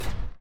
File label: mech Step Small; Step sounds for smaller mechs; Mindustry